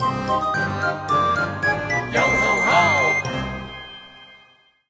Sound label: loading; cursed pirates; Brawl Stars